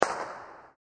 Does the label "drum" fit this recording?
No